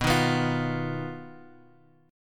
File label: B Major Flat 5th B, chord; BMb5 Chord {X 2 1 X 0 1} strummed